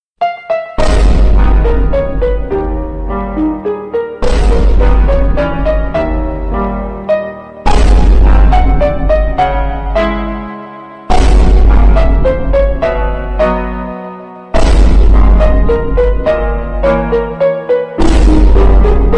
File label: Sad and Crying Piano